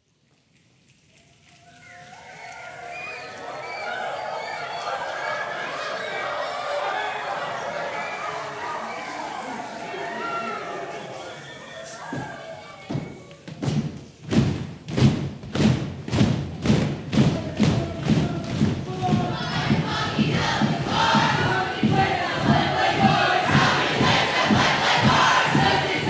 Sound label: Cheer